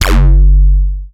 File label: SYN PUNCH, Drum Machines; Akai MPC-5000